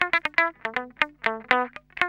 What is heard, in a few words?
GUI EDDY 120 E, 120 LICKS